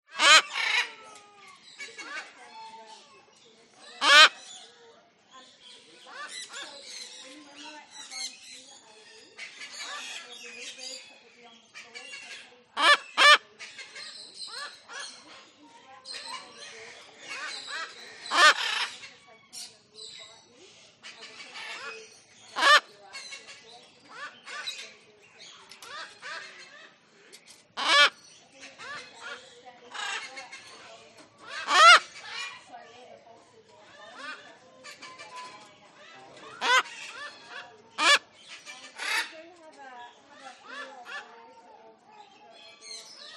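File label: animals birds parrot cockatoo squawk close up in aviary voices and other tropical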